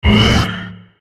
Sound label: transform end; Millennium Tree